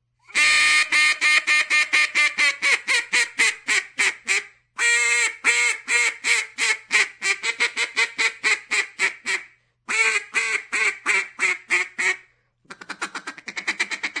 kacsa; vadhivosip

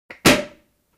effekt pezsgo

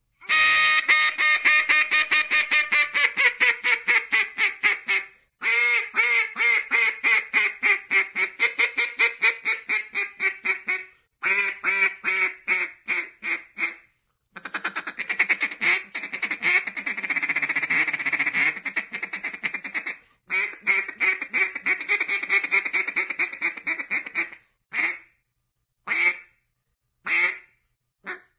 kacsa; vadhivosip

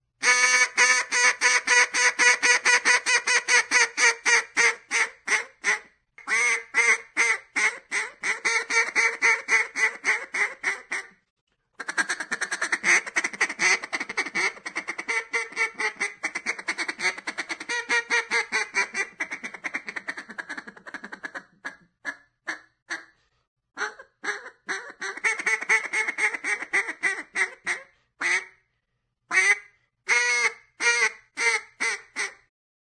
kacsa; vadhivosip

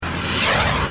effekt

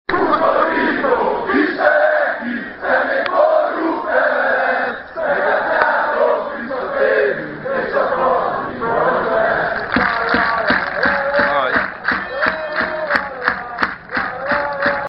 Undorító Kisteleki; Undorító Kisteleki / Szemét, korrupt MLSZ / Meglátjátok, visszatérünk, / És a Fradi bajnok lesz / Láláláláláláláá; militiagreens; szidas

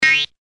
effekt ugrás

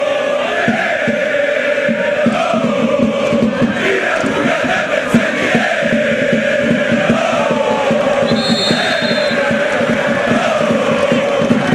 Ki nem ugrál debreceni ééé óóó; Ki nem ugrál, / Szar újpesti, / Hej, hej!!!; militiagreens; szidas

